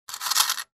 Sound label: Coins in small Coin Box tinkling